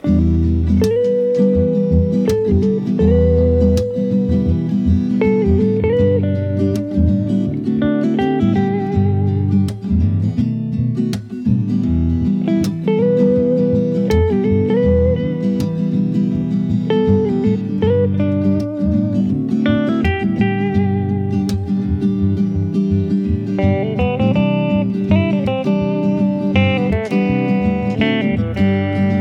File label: Instrumental